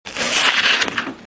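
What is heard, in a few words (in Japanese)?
suika, watermelon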